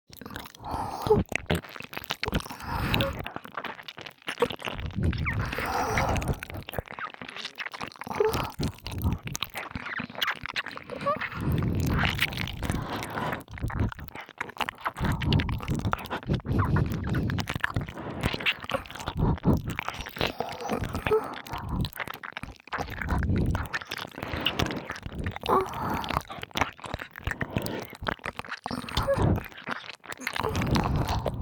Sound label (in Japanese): (両耳)ねっとりしゃぶりつき耳舐めエッチ, ASMR; 黒耳; 効果音無し版